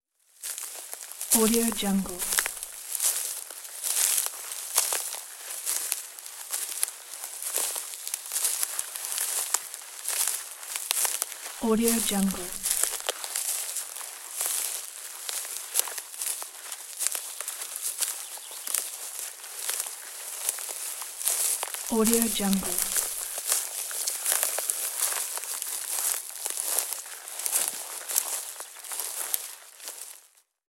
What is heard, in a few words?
two people walk on the forest grass